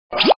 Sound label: press button; FlashChat v3.931